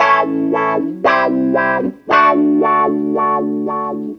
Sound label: BUS GTR; USB Soundscan vol.32; AKAI; Roots Disco